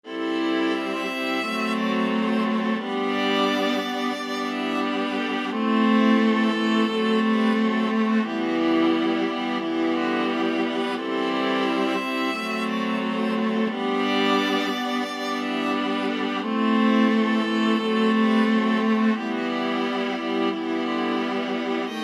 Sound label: viola ensemble; borodin polovtsian